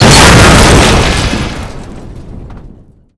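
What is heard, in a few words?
explosion metal barrel close; Explosions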